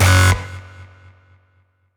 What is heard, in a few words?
basstek